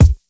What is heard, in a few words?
acoustic kick drum single hit d sharp